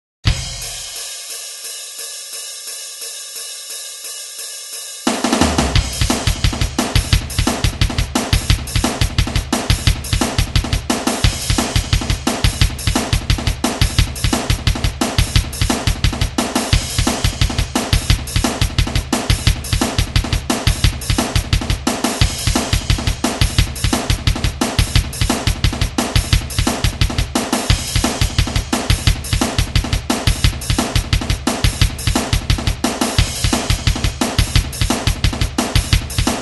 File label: drum